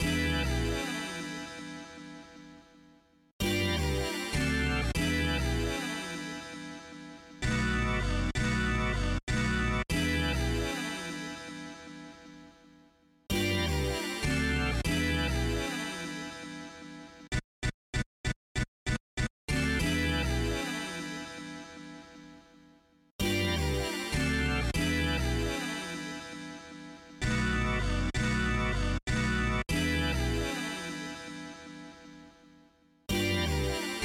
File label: Stab Melody 97bpm